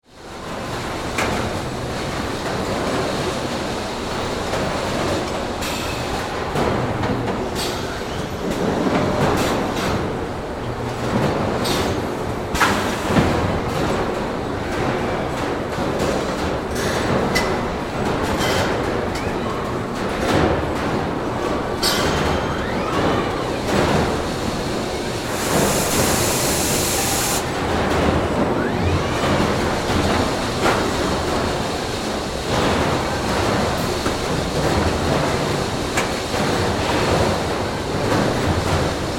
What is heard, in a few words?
Robotic Arc Welder, Industry, Factory; Robotic Arc Welders, General Motors, Gm; BlastWave